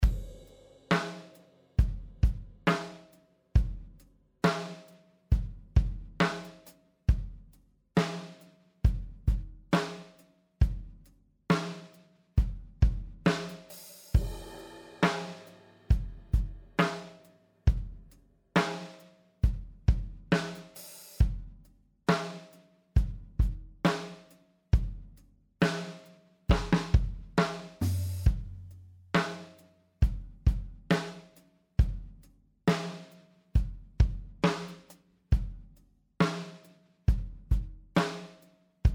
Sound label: EDM country 68bpm